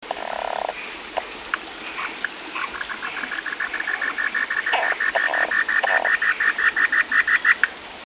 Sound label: Buergeria robustus, tree frog of Taiwan; 褐樹蛙